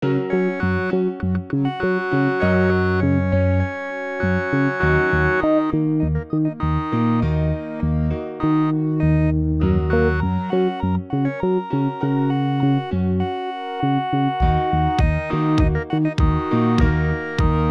piano 100bpm

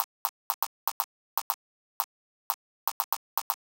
Click; hydrogen

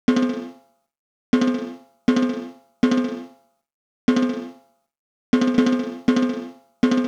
Timbal Roll; lmms; madmans

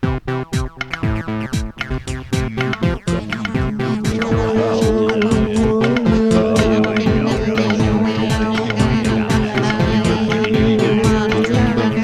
scratch pop

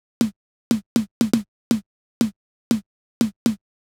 Snare; hydrogen

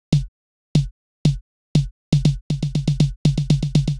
Snare Low; hydrogen

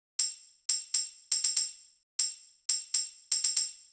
tamb; hydrogen

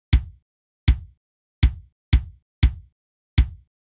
kick dry; hydrogen

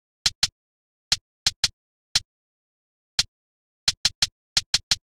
Closed HH, 87 bpm; hydrogen

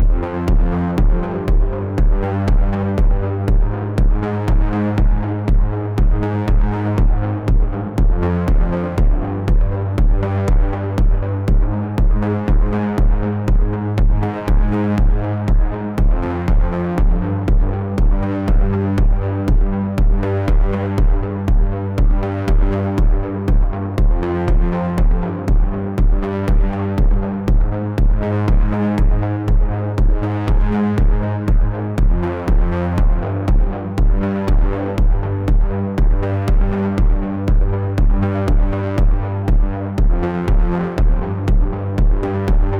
Industrial Synthpop 120bpm; ComfyUI

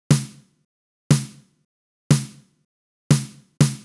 snare memphis; hydrogen

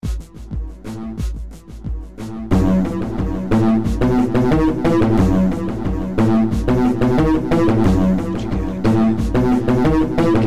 hardgroove

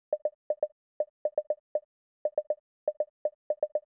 Conga; hydrogen

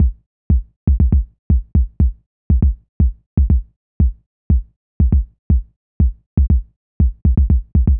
Kick Lite; hydrogen

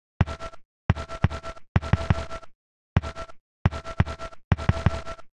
87bpm FX; hydrogen

